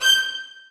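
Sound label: 2nd violins staccato g6; Sonatina Symphonic Orchestra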